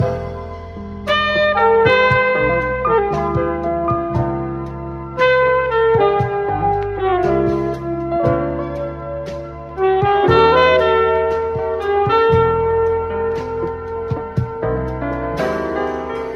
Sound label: sax and piano, Loops; Phonky